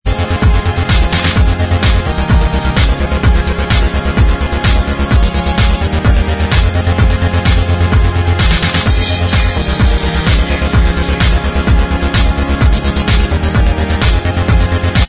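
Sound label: dance remix instrumental track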